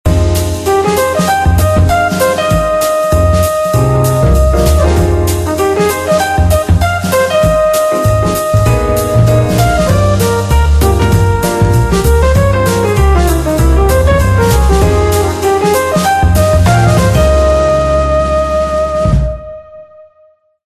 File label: late night ringtone